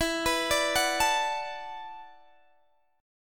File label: E 7th Suspended 2nd Suspended 4th, down, Guitar, DADGAD